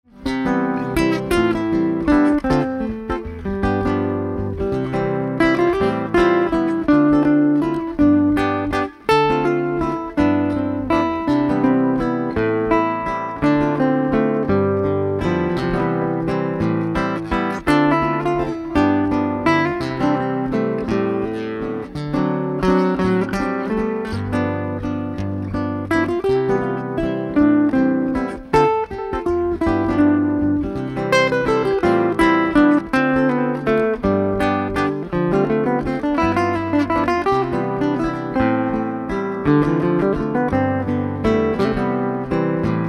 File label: Looper Waltz In C